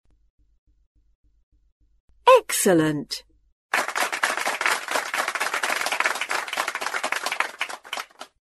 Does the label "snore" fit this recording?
No